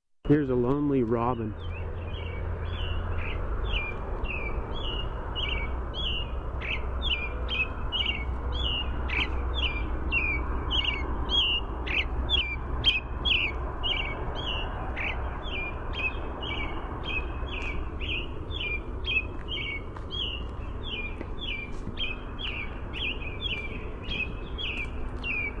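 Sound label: American Robin song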